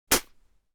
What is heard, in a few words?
Human spitting single spit